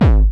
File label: Low Gabba, BDs